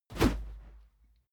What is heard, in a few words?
whoosh cloth fast soft; swooshes-swishes